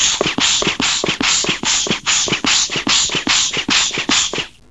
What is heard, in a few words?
Bellows; How Would You Survive (1995); Grolier